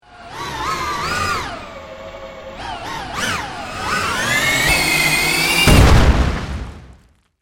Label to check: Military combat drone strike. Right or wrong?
Right